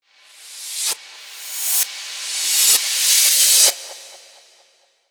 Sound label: ReCrash; FLPRO